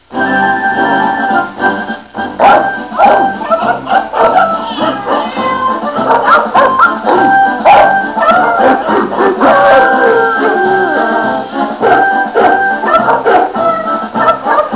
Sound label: woof woof